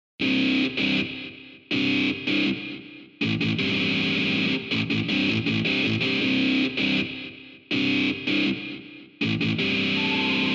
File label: fighting sports